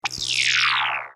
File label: stun sound; garrysmod; clone